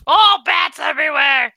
bats; shansimmortal

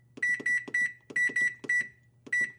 beeping sounds

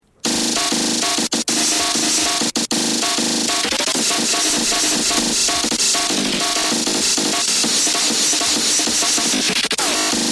horror; acid sprunki pyramixed

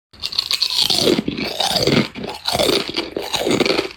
chip crunch

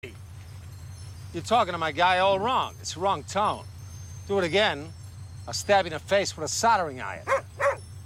wrong tone

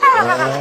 owowowow; yoshi npc

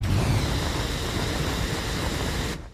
continuous fire long

